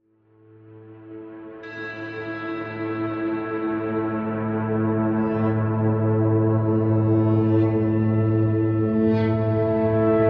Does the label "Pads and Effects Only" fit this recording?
Yes